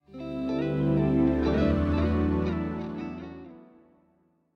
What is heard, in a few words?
Completed; Rocksmith